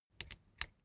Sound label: bicycle street gear control down